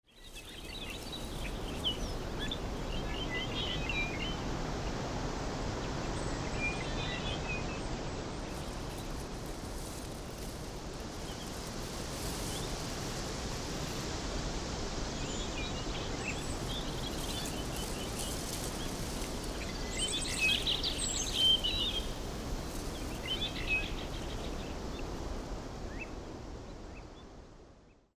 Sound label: Sample Sound Forest